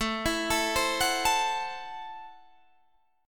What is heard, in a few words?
Gb Minor 7th Flat 5th, Guitar